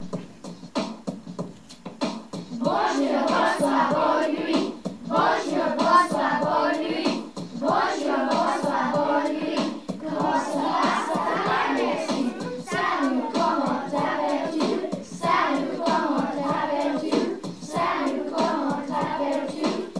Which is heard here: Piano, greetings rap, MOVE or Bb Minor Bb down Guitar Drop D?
greetings rap